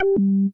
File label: notification; jappix